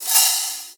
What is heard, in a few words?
Pickup Magic; SFX Kit; Pickups